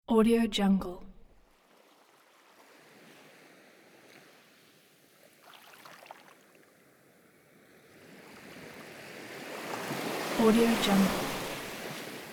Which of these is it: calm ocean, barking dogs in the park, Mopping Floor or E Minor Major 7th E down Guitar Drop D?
calm ocean